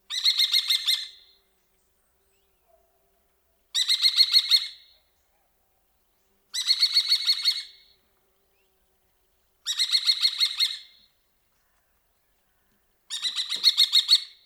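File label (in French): faucon crecerelle, Rapaces diurnes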